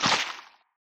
power Squish

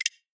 click On

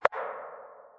power Click

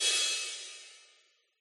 small Cymbal Crash

